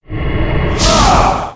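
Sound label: Mob raid attack; Monster; 레이드; 유명대제 음구유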